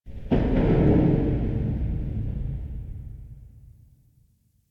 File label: Cave Metal Tension; Ambience; Warframe; Stalker Cave